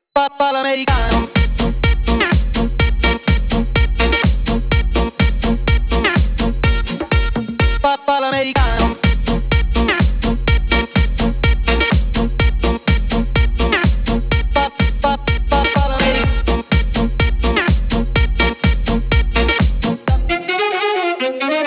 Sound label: music